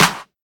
Snappy Steel Snare Drum Sound E Key, snare tuned to the E note